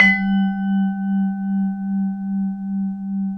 VIBRAPHONE; ILIO

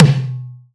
Acoustic Drums - Tom High; Heavy Acoustic Drums; Antidote Advent

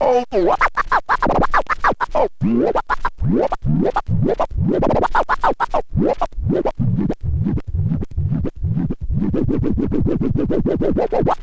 scratch long, Vinyl Attack, scratches; Masterbits; scratches mix

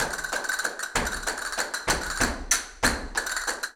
Castanets, Flamenco; Best Service ProSamples vol.46; Percussion

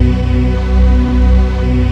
VINIL PAD; Rubber Chicken Software Sample Bank; CYPERPUNK 2

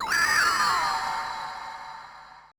AMBI FX

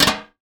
Tin Tray, household rhythm samples hits

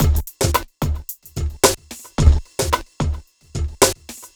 hiphopbeat; Sonic Foundry (Sony Creative Software); Ugly Remnants VOL-2

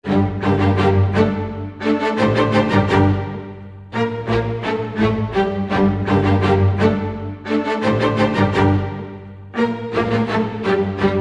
85 g min strings; PowerFX - Downtown Orchestra

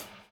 DOWN, DOWN KIT 78

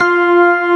AMB ORGAN; WORS STATION; AMBI BLEEP

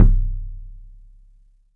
THUD KICK; 3D Drums

